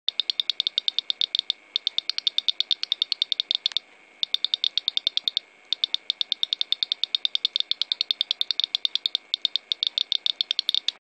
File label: Prototype Geiger counter simulator